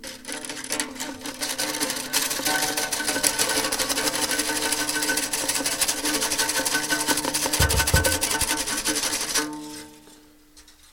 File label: the sound of my viola d amore